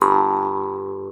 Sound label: MT G, JHARP G B; Xsample VOL-9